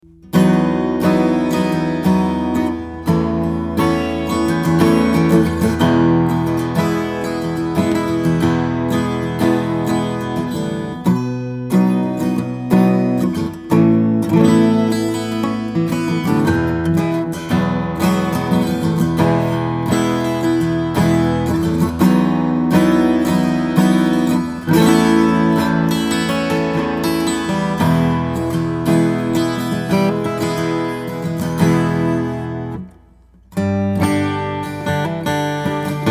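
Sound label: martin d 12 20, Guitars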